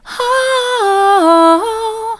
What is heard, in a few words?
ooh soft, vocal